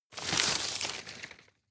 rustle medium short; berufe

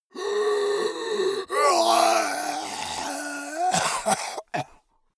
monster die; kreaturen

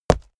step walk stone; schritte

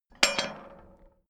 small metal drop; industrie wirtschaft; metall

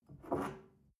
shell grab from table, gastronomie; berufe; soundpool

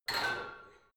barbell heavy plates lift rack reverby; sport freizeit; fitnessstudio

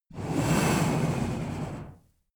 reptile breath avarage soft large; kreaturen

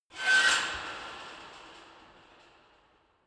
metal squeak rattle; metall

